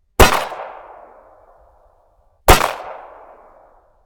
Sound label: revolver shots; wilder westen; magiaz